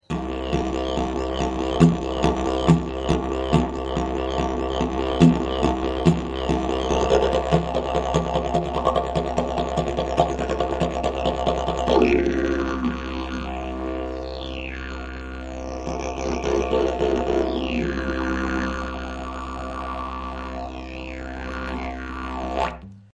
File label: didgeridoo